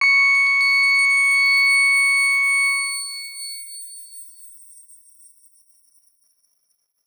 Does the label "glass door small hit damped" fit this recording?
No